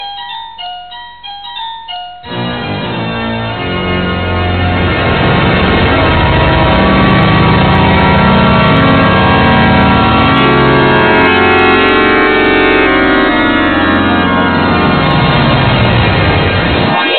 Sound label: Watch Chime; The Town with No Name; Amiga